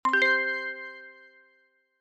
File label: scan complete